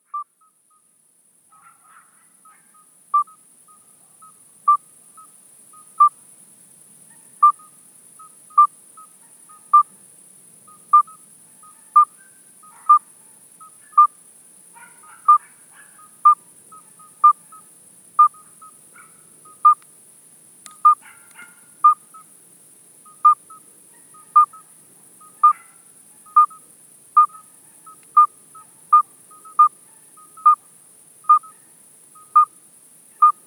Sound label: midwife toad alytes obstetricans; lyagushek i zhab